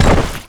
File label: grunt dirt land